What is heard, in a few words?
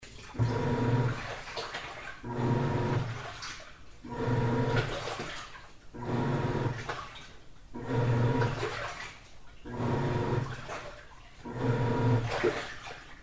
washing machine